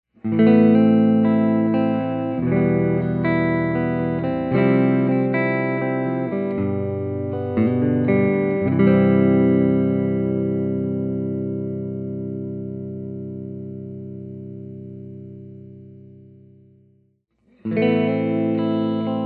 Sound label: p90 clean, guitars; axxis